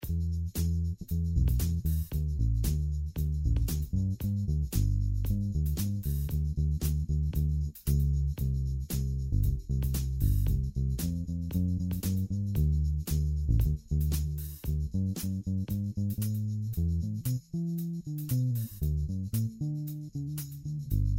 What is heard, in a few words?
LP Triumph